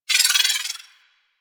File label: Pistol Proj Whiz; weapons; Warframe; Thanotech